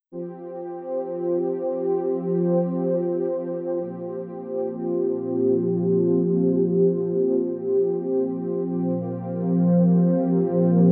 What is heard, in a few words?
meditation music